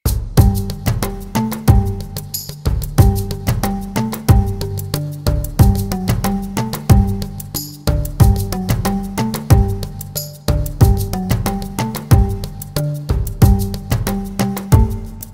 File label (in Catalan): Caga Tió